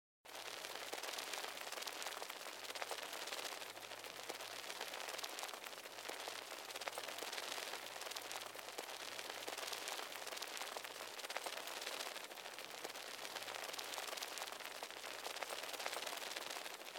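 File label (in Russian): большое количество разных бабочек в закрытом пространстве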